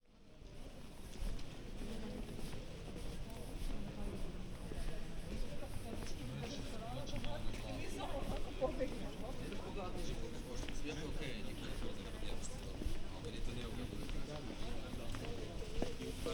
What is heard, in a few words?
binaural